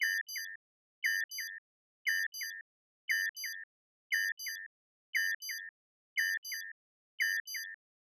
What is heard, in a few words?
Tonal Beep Signals Warning Alert 12 osc